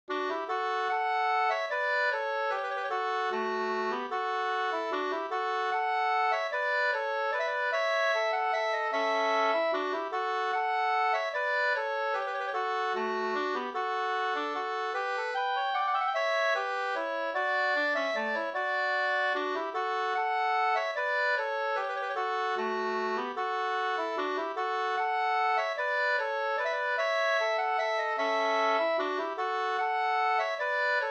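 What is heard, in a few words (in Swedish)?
vals, two-part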